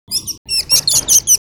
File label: tweet, degu